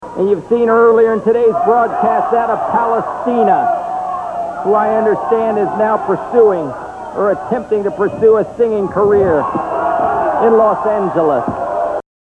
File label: singing; singing career